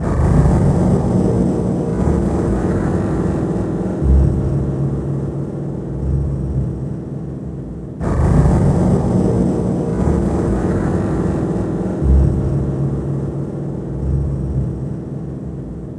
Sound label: Dark Beat, Dark Organic Processing; Sonic Foundry (Sony Creative Software); Loop Noir